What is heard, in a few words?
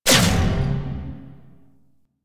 Electricity Hazard Pulse; Warframe; Entrati; Vaults